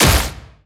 cage wall bounce

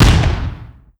explosion weak

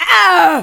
hurt

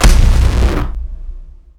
forest boss stomp

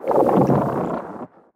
growl medium; fistula

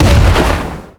rocket explode

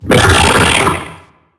death; larry jr

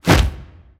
bighorn hand appear

